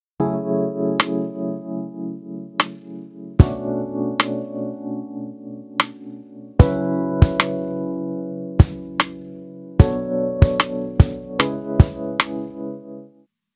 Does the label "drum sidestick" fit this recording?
Yes